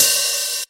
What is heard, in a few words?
low treble hat sound f key, Royality free closed hi hat sample tuned to the F note